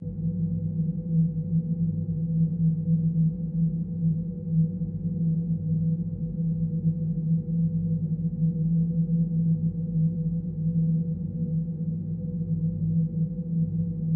Ambiance, Machine Throb; Constant, Mid Range, Throbbing Mechanical Presence